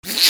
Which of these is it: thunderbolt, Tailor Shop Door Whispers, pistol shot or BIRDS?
BIRDS